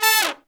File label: Pop & Funk Brass, BRASS; Best Service ProSamples vol.25; AKAI